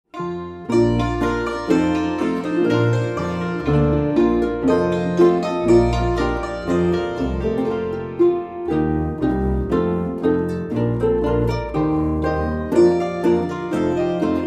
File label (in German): Spielmusik Saitenquintett